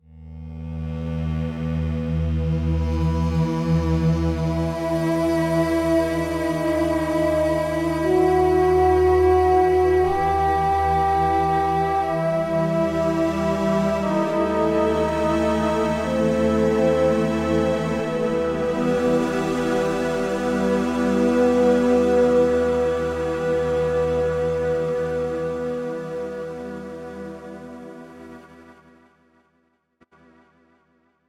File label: Mellow Approach